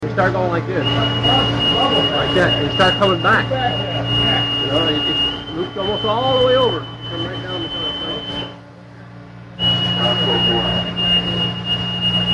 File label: General Quarters, bugle calls